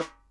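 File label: snare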